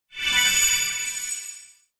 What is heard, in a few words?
Holy magic spell short attack; angelic